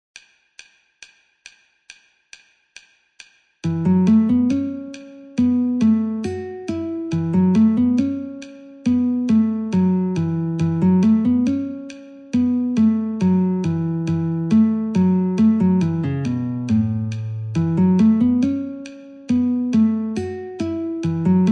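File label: A Guitar metronome 69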